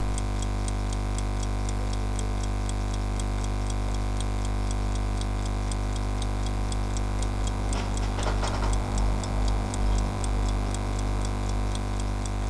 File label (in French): fusee tick